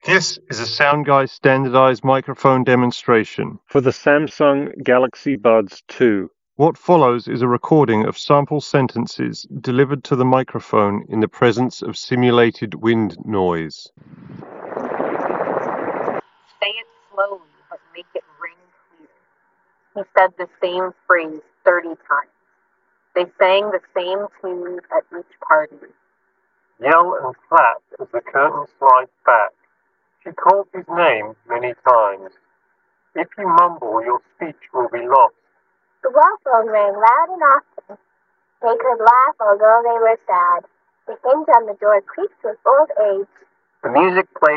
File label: Wind microphone sample; Samsung Galaxy Buds 2